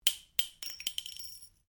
Cartridge 38 special impact ceramic tiles, shell casings; shell casings of different calibers impacting on different surfaces